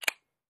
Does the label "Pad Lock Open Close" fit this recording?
Yes